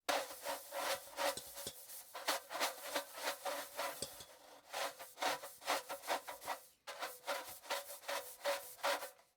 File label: scraping metal